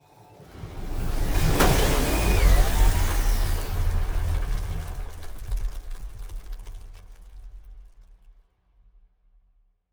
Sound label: ship transport ship move; Stellaris; machineage